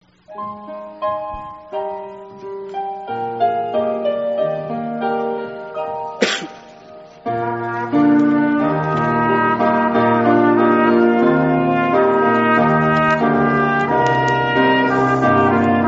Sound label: Trumpet Piano Offering